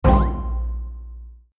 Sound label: match; Dora and the Lost City of Gold - Jungle Match